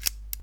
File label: m60 bipod; mg; garrysmod